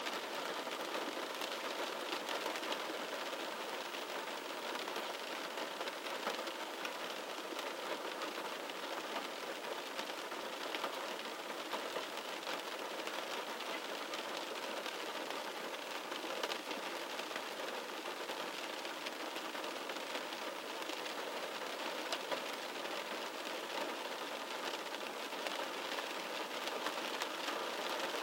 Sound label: raining